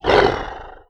Monster Growl; openclonk

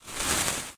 Fuse Short; openclonk

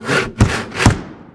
Finish Building; Structures; openclonk

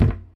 Dull Wood Hit; openclonk

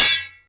Light Metal Hit, Metal; Hits; Materials

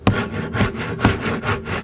Repair, Structures; openclonk

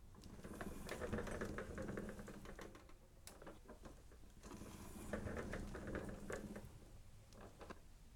Wheels Turn; Structures; openclonk

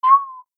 Whine; Animals; openclonk; Wipf

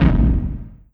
Blast Liquid; Fire; openclonk

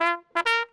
Trumpet; openclonk; UI

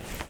Rustle; openclonk; Objects

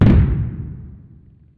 Blast Liquid; openclonk

